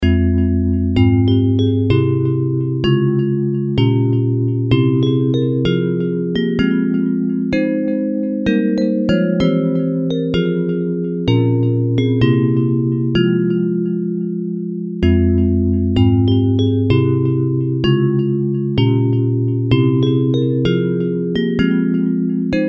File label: Bells, Hymns